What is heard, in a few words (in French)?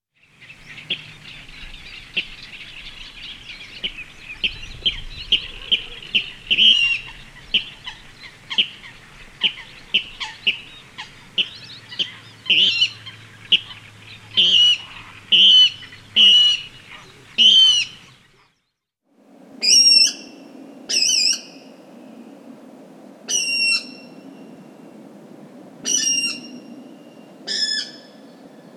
Rale d'eau